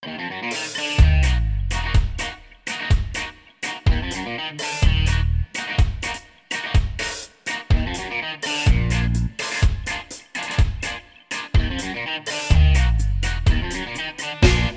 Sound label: SINGBACK, BULGARIAN